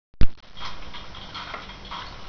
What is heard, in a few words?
aircon